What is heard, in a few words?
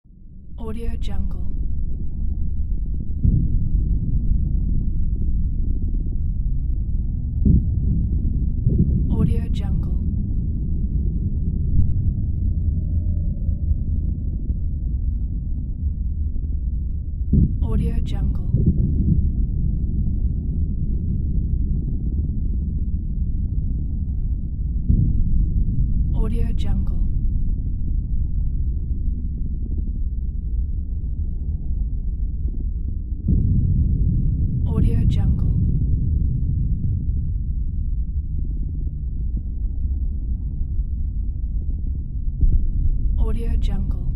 deep mistery atmosphere